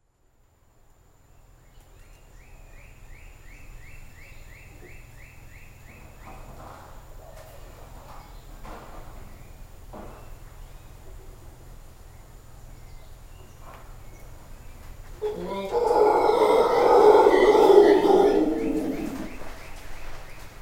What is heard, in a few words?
howler dusk; matapalo